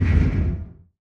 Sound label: distance shield hit, weapons; Stellaris